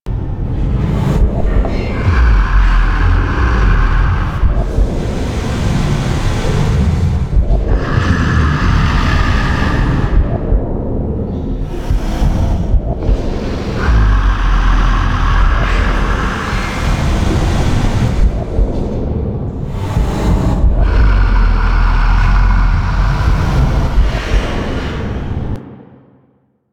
Dormant Vessel Loop Close; Props; Ambience

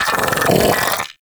Arachnoid Vocal Attack; Enemies; Vocals; Warframe; Corpus; Arachnoid Hunger

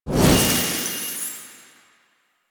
Horse Racing Portal Touch; Duviri

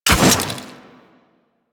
Quill Dart Gun Mech; Warframe

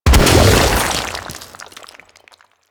Infested Bone Blade Mortar Proj Impact; Warframe

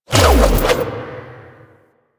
Spin Whoosh

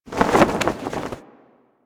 Flag Flap A; Warframe; Ambience; Grineer; Grn Flag Flaps

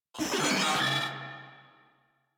Vocal Enemy Spotted, Enemies; Warframe; Moa

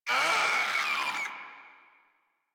Infested Runner Attack; Warframe; Runners

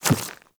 Inf Small Walk Dirt, Infested, Footsteps; Enemies; Warframe

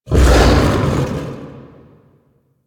Axe Weapon Wind Up; Warframe; Tenno; Wolf Frame

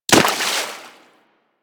Melee Impact Water; Warframe; Weapons

